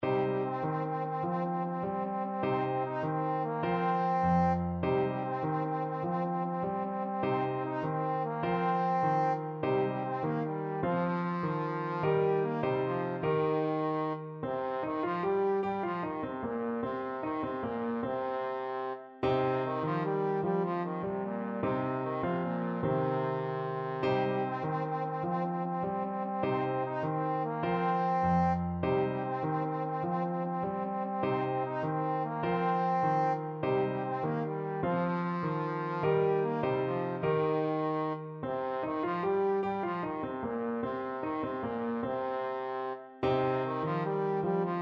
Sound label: adour badour, trombone